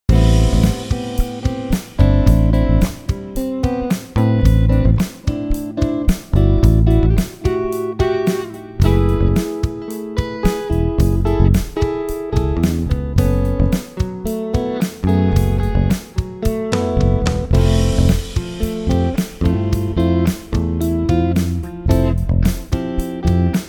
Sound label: A minor A major; impro; practice